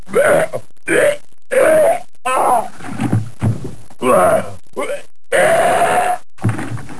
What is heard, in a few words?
PUKING